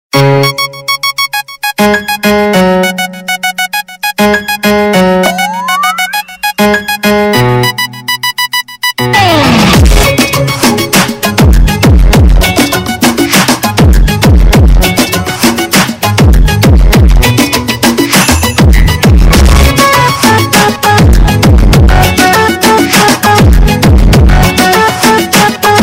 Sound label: Full Bass